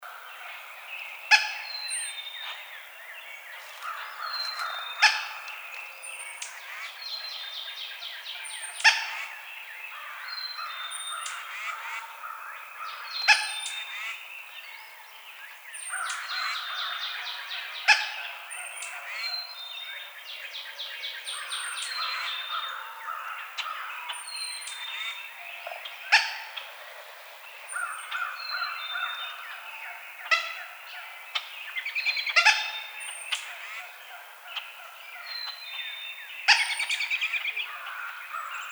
Coopers Hawk

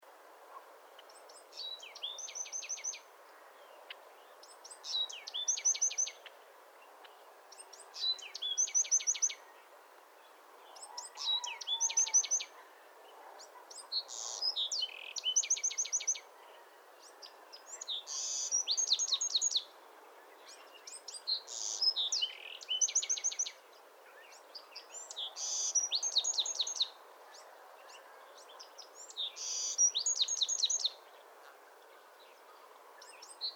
Chihuahua, birds

